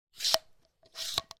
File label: household vacuum cleaner hose nozzle attachment brush slide up down